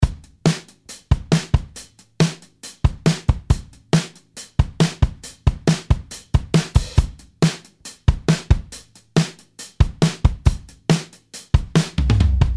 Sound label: Drums no Devil Loc